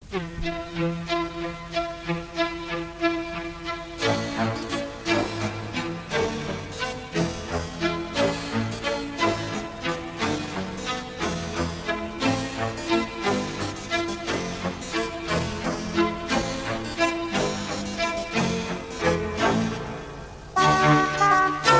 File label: instrumental